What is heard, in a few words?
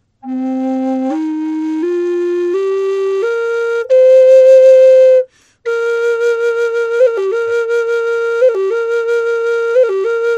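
Cedar Classic, flute, woodsounds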